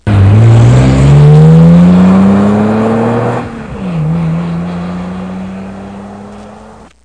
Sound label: Car